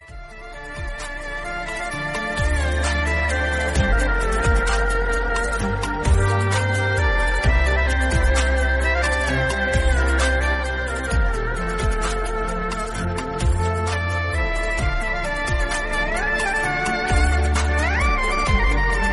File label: pakistani drama ost tone ringtone